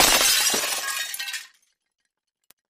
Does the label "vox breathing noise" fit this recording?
No